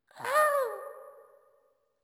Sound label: Wail; SFX; GGJ 2022